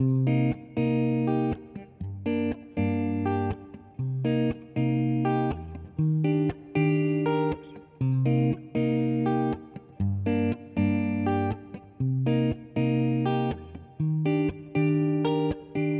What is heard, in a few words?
Guitar Loop 120BPM Cm; 99Sounds; Monosounds; Music Loops; Instrument Loops; Guitars